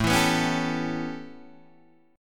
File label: A 9th A, Guitar Open D